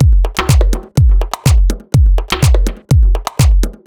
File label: B 124bpm, House Drumloops; Zero-G House Fabrik Drumloops